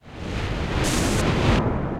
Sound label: Crackle Storm; Effects; Ableton; Factory Packs; Granulator III